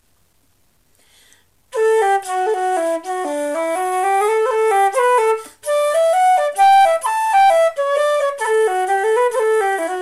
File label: hornpipe, slow; The Leitrim Hornpipe